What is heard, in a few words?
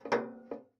Ping, Kitchen Toaster